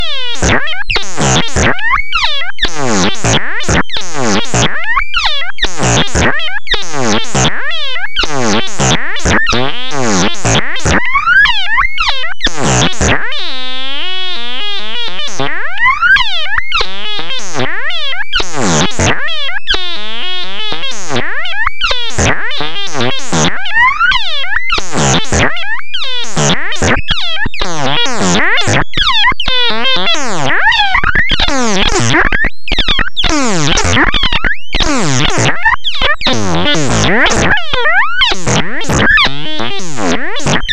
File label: birds with gas; feedback